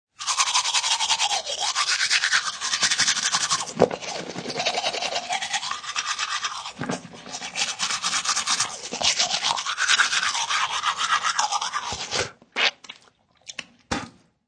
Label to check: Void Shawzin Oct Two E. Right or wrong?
Wrong